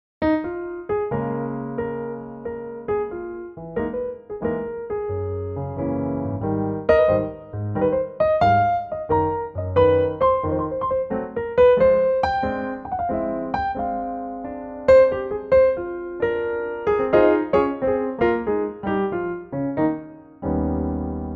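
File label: Ab Piano, male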